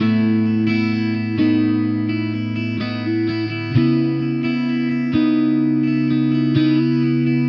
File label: Electric Pop Guitar 128BPM C#min; Electric Pop Guitars; Vengeance - Essential Clubsounds Vol.4; Guitarloops